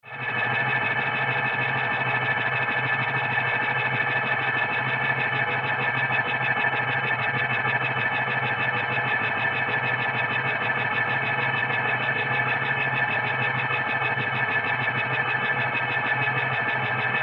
Helicopter SciFi Futuristic, Helicopter Interior Futuristic High-Tech Elements; Chopper; Sound Design